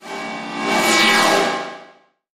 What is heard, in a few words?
Hard Hollow Sweep